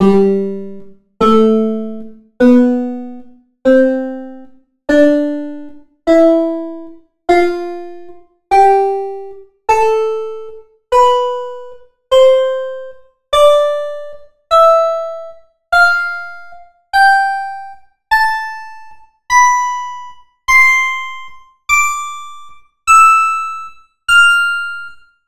pizzicatostrings

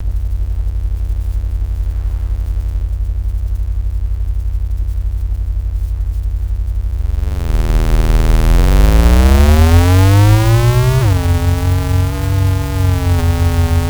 electric

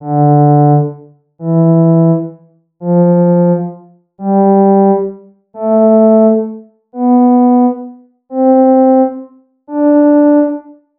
clarinet

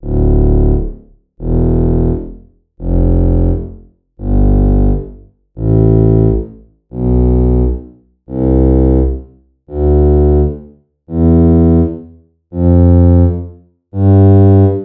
tuba

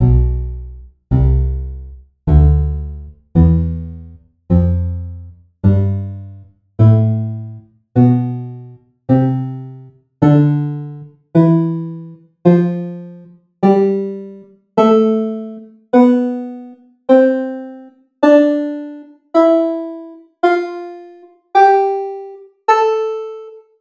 orchestralharp

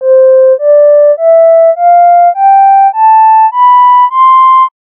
flute

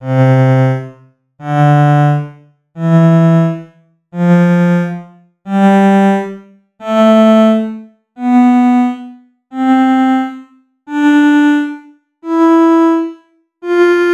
viola

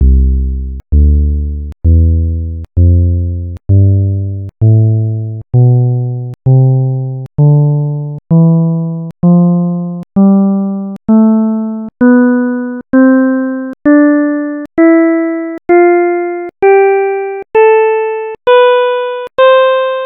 strings

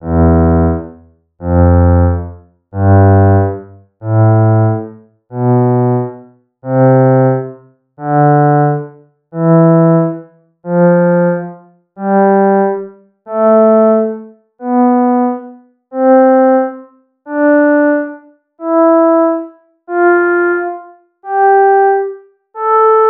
trombone

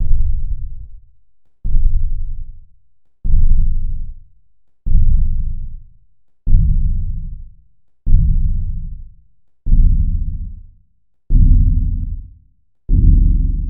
timpani

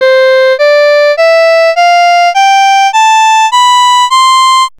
violin

